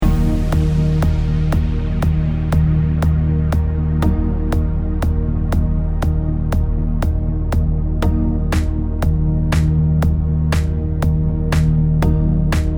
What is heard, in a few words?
Electronic dance